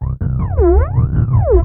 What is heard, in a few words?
FLANG LP, Korg, FX; Back In Time Records; Korg Universe VOL-1; FX FAMILY